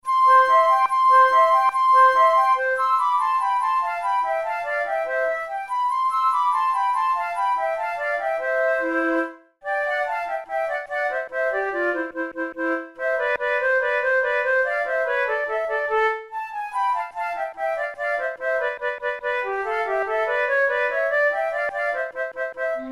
duet

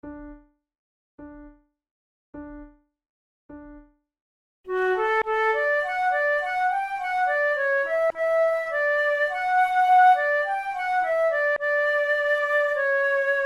duet, flute

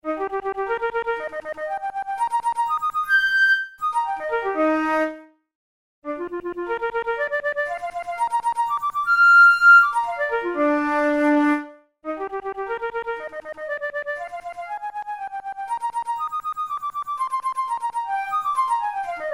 methode pour la flute study

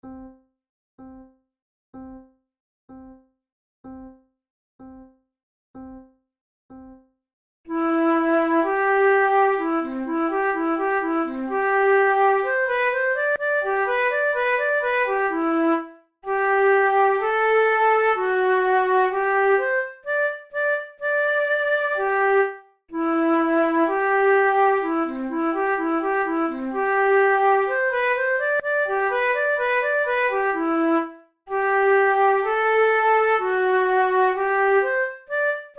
horn duos andante; mozart

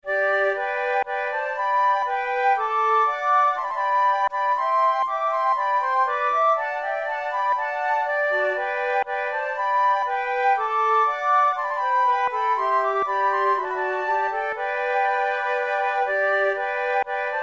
trio, flute; charpentier; te deum

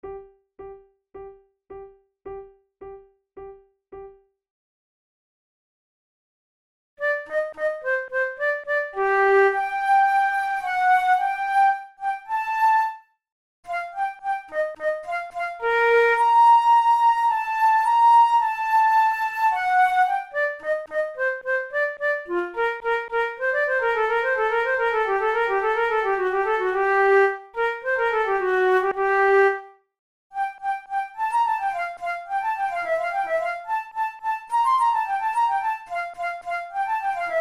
allegro, flute tunes; loeillet de gant op5 no10 iv

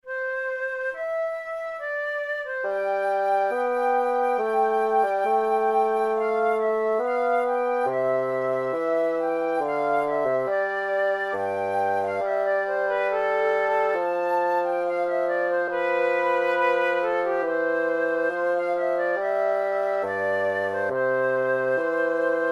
adagio, flute

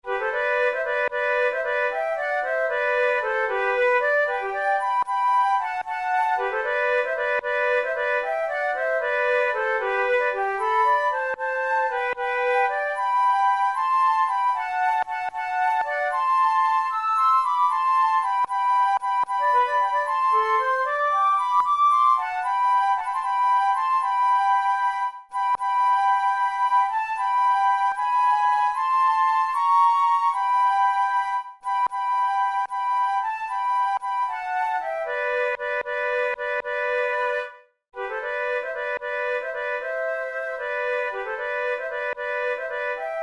duet, flute tunes

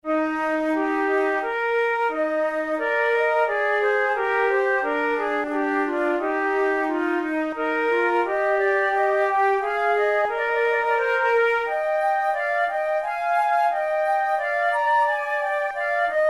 prelude, flute

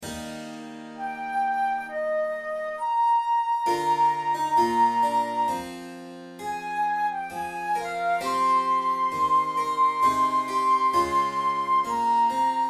recorder sonata in g minor adagio

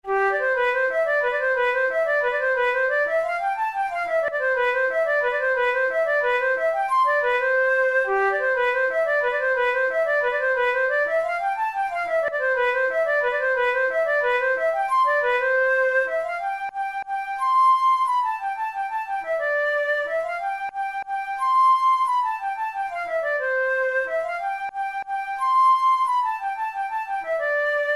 waltz, flute

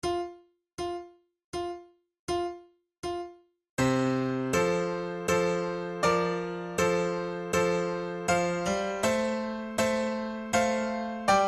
cradle song, flute

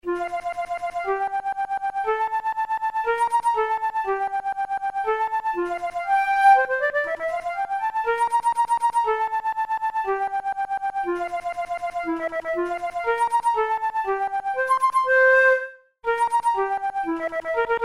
studies for the boehm flute; drouet 72

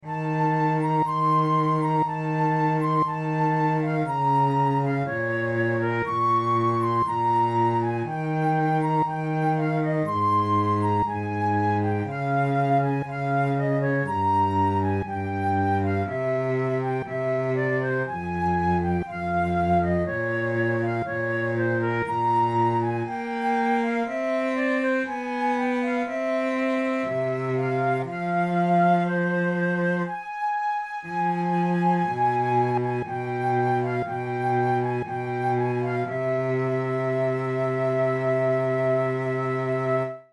adagio, flute

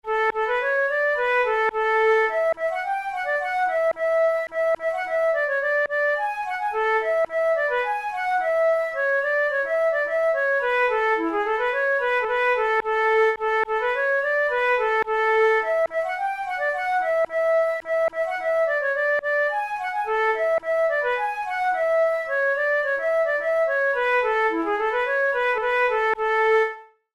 bold captain freney, flute

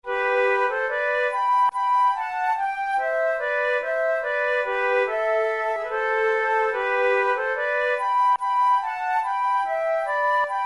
duet

